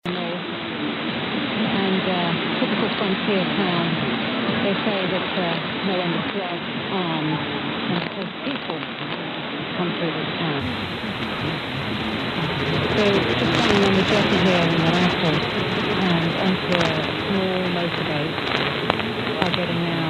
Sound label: BBC 198khz AM